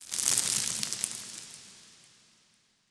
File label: environment rock debris